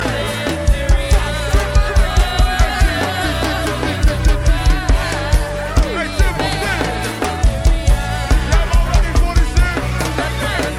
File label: Rockband